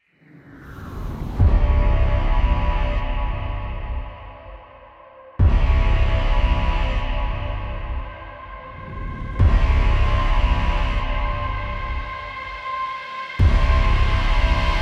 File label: Intense Action Trailer; intense trailer themes